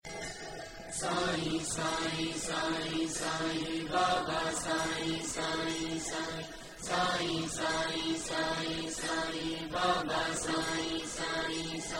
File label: ringtone